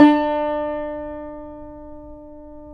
yayli tambur; Guitars; Spectrasonics; Hans Zimmer Guitars VOL-2

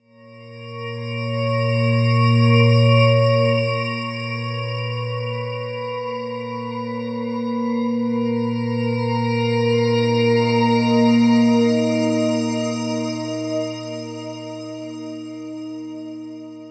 SLOW MELODIC BELL WAVE; SLOW SYNTHESIZER WAVES

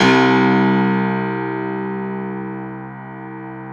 Classical Piano ff, C0; Total Piano; Brilliand Classical Piano; Classical Piano ff -ped

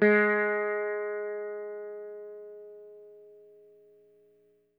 EL SITAR SFT; Quantum Leap - Guitar & Bass

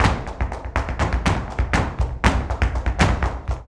BULERIAS TAP, Flamenco

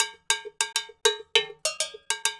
Cow Bell, Latin Percussion

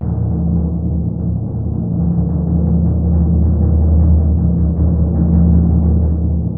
TMP, Synclavier Percussion

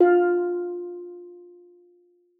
steeldrum F3; Xsample VOL-4

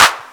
Fairlight IIx CMI CLAPTRAP

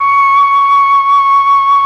FLUT1; ILIO; Propeller Island Legendary M400

